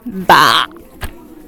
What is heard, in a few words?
baaahahahahaa